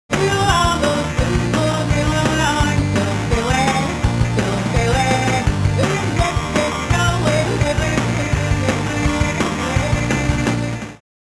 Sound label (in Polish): dzwiek aliasing